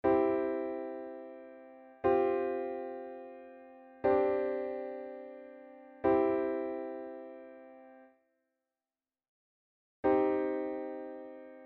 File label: suspended chords